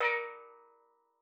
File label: STEELDRUM A#3; Xsample VOL-4